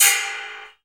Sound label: RECO-RECO UP, Latin Hand Perc; E-MU Producer Series Vol. 2; More Studio Essentials; Composer